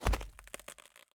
ice land; teardown; ps5 windows xbox series xs

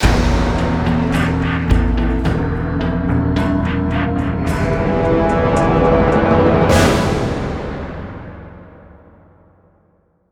Catacombs

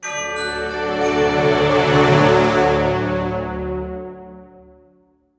MUSIC HUB PUZZLE STING; lego dc super villains 2018 nintendo switch gamerip

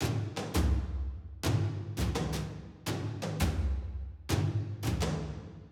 Encounter End Loop

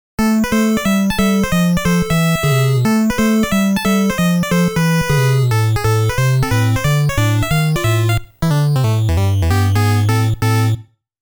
All Clear; Babel no Tou; Sky Kid; Metro-Cross; Game Sound Museum Namcot

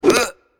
Character Moustache Hit; Angry Birds Epic

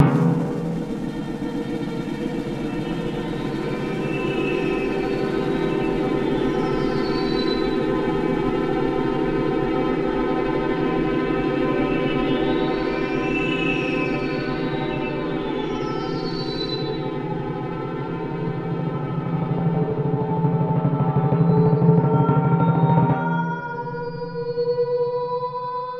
magician performance music; The Sims 3; windows gamerip 2009

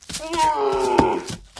Llama Man Attack

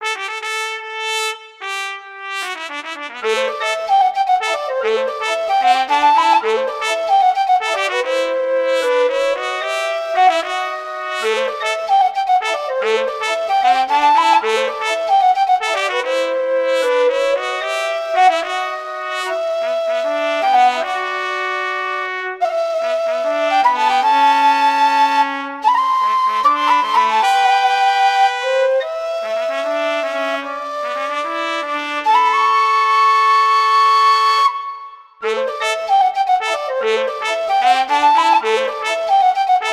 Melody (Duet); Small Saga

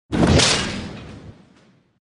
Barbarian Skill

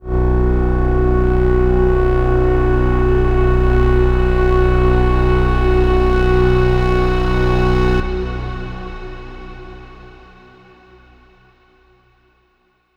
Overlay Drone; Act1 Anchor

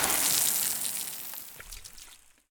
liquid; teardown; ps5 windows xbox series xs

